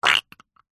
liquid gel squeeze short squirt wet

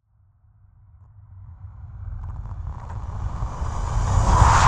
Swell Ghostly; bottlerocket

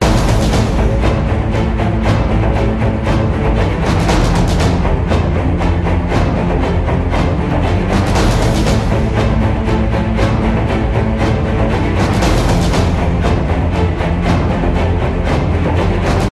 Epic; orlamusic

